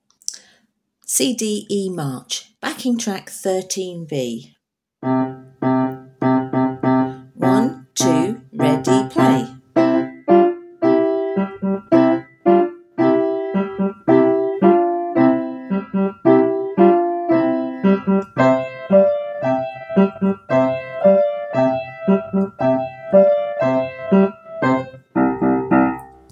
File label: March backing track, keyboard and piano